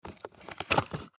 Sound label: Candy Remove From, Candy Remove From Box Sucker; Food; Foley